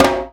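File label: HAND DRM, hand drum; Spectrasonics; Heart of Africa 1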